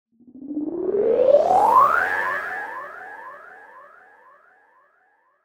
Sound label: vital presets; chiqui audio; vital fx presets